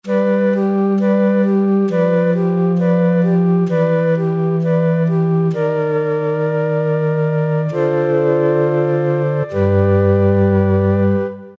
Tron Flutes, Alesis QS8; Factory Preset examples

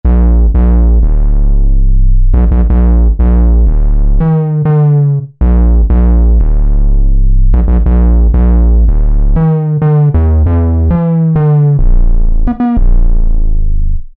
Ba-Hardstep, Yamaha EX5R; Factory presets

